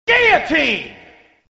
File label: guillotine attack